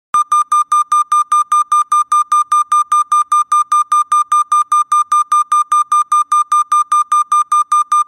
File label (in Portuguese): Pi mais agudo